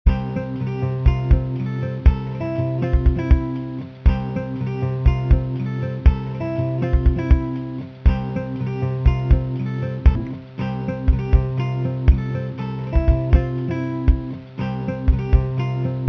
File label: Strings WITHOUT voice